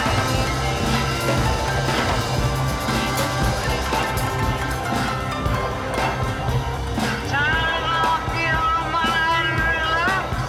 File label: Tack Piano